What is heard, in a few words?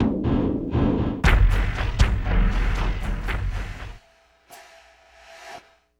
Elektro Big Bang, Drums; Sonic Foundry (Sony Creative Software) - Friendly Fire; Marc; Steve